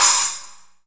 Splash, Drums; BGM; Nintendo; July 2020 Gigaleak

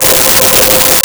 Choir Oohs C5; Nintendo; July 2020 Gigaleak

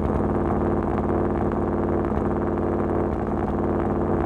hotrod, warthog, vehicles, loop, med; warthog left

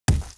heavy dirt, dirt hits

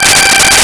Reed Organ G5; Nintendo; July 2020 Gigaleak

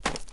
run, elite footsteps, dirt

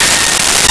Mute Trmpt Bb5; Nintendo; July 2020 Gigaleak

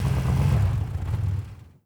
warthog out; vehicles; new warthog right

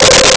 Ocarina C5; Nintendo; July 2020 Gigaleak

